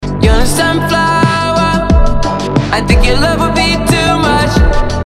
follower alert; dlive

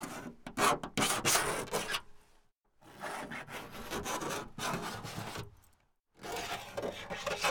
keying car

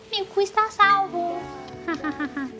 haha

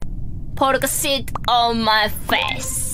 polka

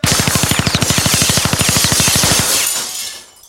gun shots

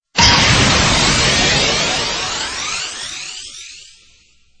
pokeball sound effects

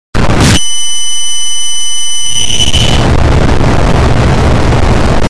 loud flash bang

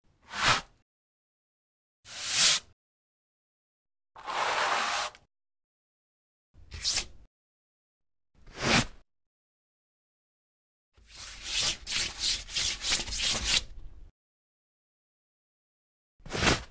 Brushing Sound

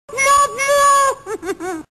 sad music; mlg

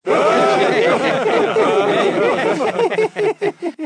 herb laugh; Lachen

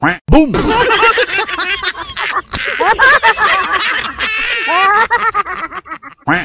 quack boom

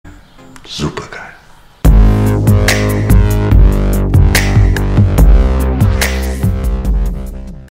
bass

